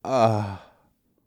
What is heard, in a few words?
groan